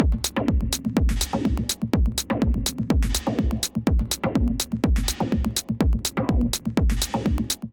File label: Jackman Kit 124 bpm; Ableton; Punch and Tilt